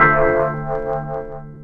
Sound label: RHODES; E-Lab; X Static Goldmine 2